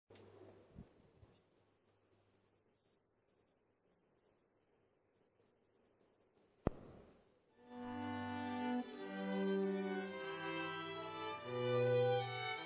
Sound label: quartet; niigata grace chapel; message